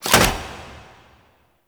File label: Fist Ape Sprint; Enemies; Warframe; Tyl Regor; Fists